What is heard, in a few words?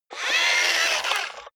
robot movement very slow